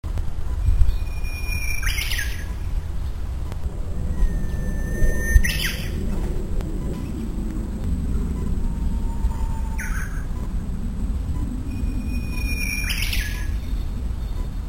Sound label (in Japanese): uguisu